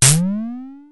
Tom, Drumfire DF-500

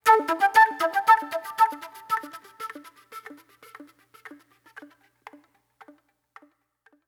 Soul Plate Flute

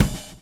groove; AMG Gota Yashiki Groove Activator

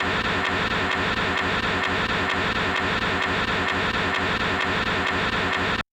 kawai k1 PIANO NOISE ALT; wavetables

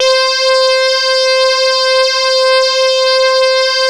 SAW RAVE, LEADS

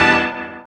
DR. LOOP; X-Static Goldmine 3; CHORDS

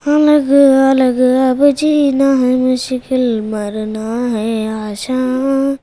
CHILD, Indian Traditions

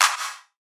80s Clap

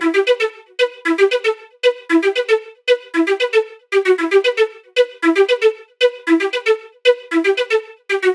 115 E Seq Staccato; Zero-G; Future Wave

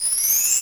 Dancer Cheers; Fire Emblem - Genealogy of Holy-War

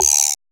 DRM OLD, dance synth

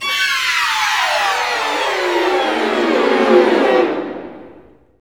STRING GLISS; Roland - LA Composer vol. 1

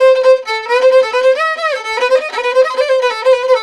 VIOLIN, Balkanish Traditions

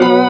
SYN RED-OR, dance synth; Sound & Vision - Gigapack I CD 2 (Roland)

